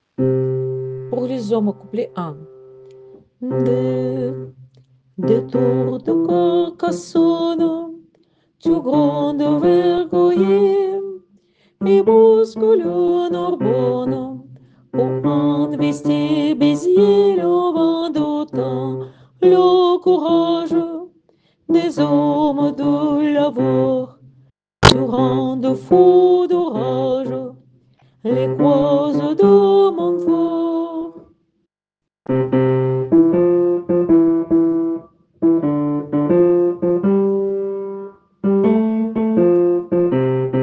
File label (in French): Vent d'Autan couplet Basses